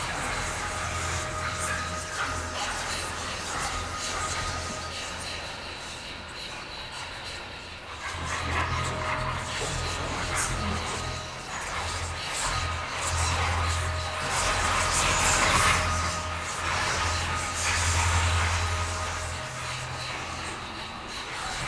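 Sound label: ship psionic ambient aura; Stellaris; shroud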